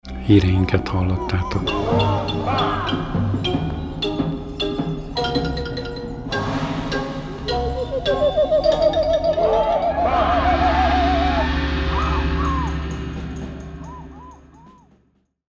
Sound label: signal; Czaban